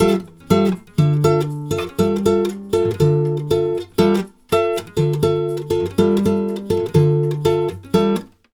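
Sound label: 120 guitar G minor 7, Brazil Bossa; USB Soundscan; AKAI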